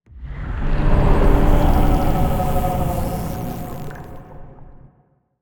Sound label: giant sea monster